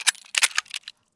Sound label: elite reload start; Counter Strike Model Pack; CS2